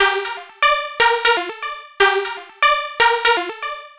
Techno Synth

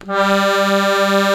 AKORDEON G 1, ACCORDION

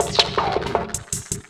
Loop, Drum & Bass, 160 BPM; Ueberschall; Drum & Bass Flash Trackz

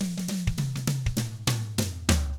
funky, drums

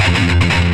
GTR, Distortion Gtr; PlatinumPhattCD2; E-MU Producer Series Vol. 8 – Platinum Phatt

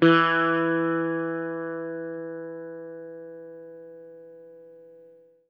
EL SITAR HRD; Quantum Leap - Guitar & Bass

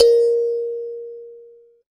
kalimba a#, Thumb Piano; ambient; Chillout

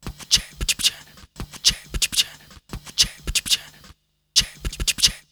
Beat Box; Sonic Foundry (Sony Creative Software) - Fluid Dynamics Drum & Bass; Construction Kit

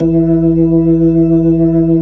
B-3 Jazz E4; Keyboards of The 60's and 70's

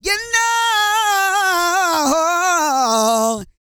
REGGAE, Vocal Planet World Voices

